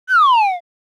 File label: Cartoon slide whistle down